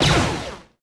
Droid Blaster; weapons

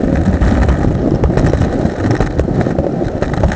roll glass, terrains; Tony Hawk's Project 8; XBOX

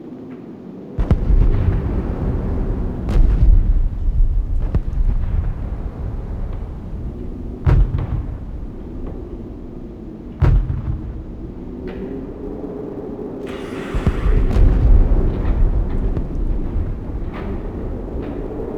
wind war; Terminator 3 Rise Of The Machines; XBOX

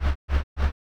fan; Soldier Of Fortune II Double Helix; XBOX; ambience

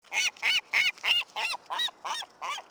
Seagull; Project Gotham Racing 2

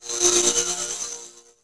sizzle; interface

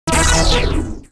activity win

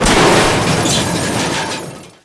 Large Impact; Totaled; XBOX

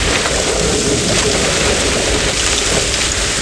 roll leaves, terrains; Tony Hawk's Project 8; XBOX

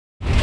dumpster move start

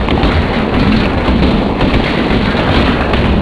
roll metal grating, terrains; Tony Hawk's Project 8; XBOX

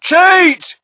crowd, shout; Mike Tyson Heavyweight Boxing

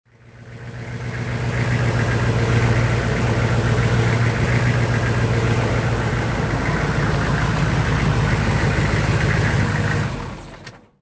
arm2truck; Soldier Of Fortune II Double Helix; XBOX

